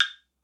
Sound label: clave; eddyflux